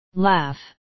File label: laugh